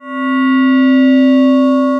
Ambient & Evolving; Ableton; Glitch and Wash

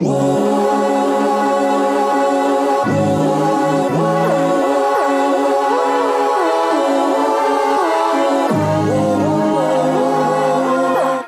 Voxy Lady C# Minor 85 bpm; MIDI Clips; Chromatic; Ableton; Factory Packs; Voice Box; Transformed

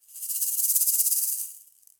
Maracas FX; Latin Percussion; Drums; Drum Hits; Shaker; Ableton; Factory Packs

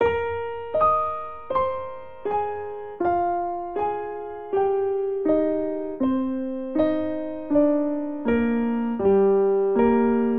Phrase Relaxing Eb Major9 80 bpm, Grand Piano; MIDI Clips; Ableton; Factory Packs

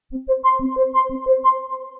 Azimuth Arp Plucks, Synth Rhythmic; Ableton; Creative Extensions; Racks

